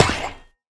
hurt, copper golem weathered; Minecraft

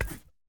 multi swap, shelf

